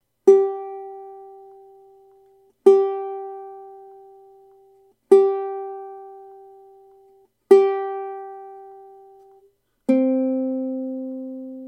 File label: ukulele tuning notes